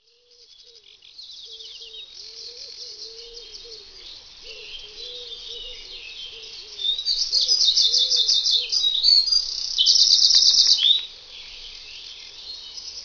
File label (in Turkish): Bulbul Kanarya Sesleri, Telefon Zil Sesi